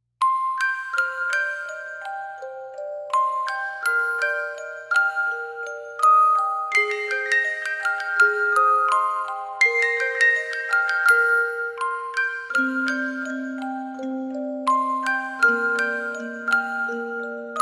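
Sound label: Landing BGM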